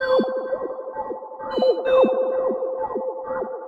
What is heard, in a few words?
Sci-Fi Radio 130BPM